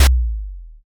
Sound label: taiko